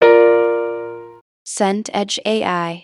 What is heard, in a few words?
piano chord bright